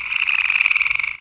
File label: critter, canals; Half Life Model Pack; Half Life 2; ambient levels canals